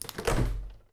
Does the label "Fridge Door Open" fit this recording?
Yes